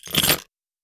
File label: feed; snake